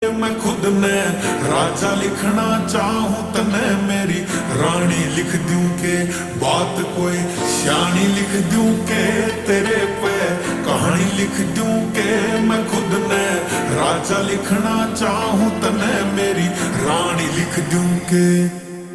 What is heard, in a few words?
Ringtone Slowed Reverb